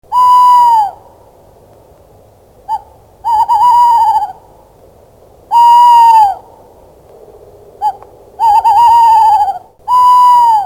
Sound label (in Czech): Pustik obecny